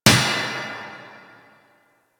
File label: Worker Hammer Impact; Warframe; Venus Open World; Venus Solaris United